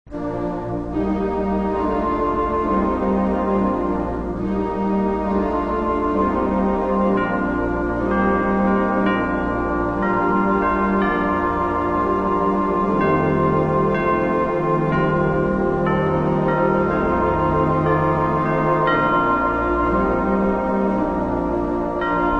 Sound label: Cathedral Organ And Chimes